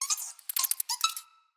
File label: Misc Squeaks, Animals, Vocals; Floppet